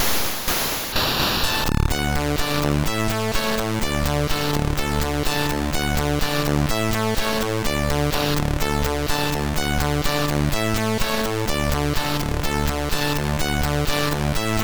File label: Campfire, MOS6581R2